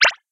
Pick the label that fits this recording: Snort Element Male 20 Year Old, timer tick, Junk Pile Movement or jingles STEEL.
timer tick